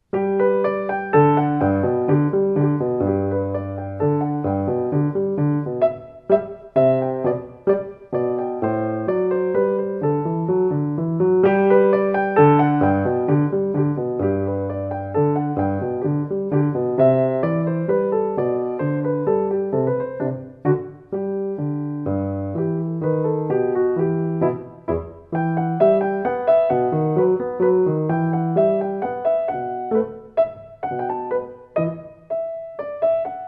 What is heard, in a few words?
Minuet in G Major